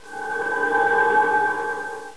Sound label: Knight Defense